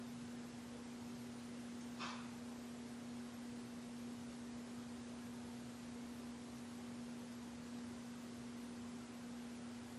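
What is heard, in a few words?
Ballroom Static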